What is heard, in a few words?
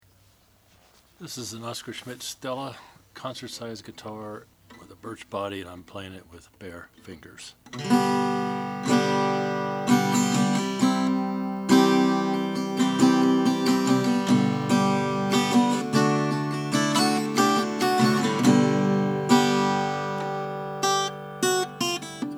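oscar schmidt stella 570 natural, Guitars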